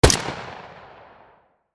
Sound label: AK 47 assault rifle single shot; gfxsounds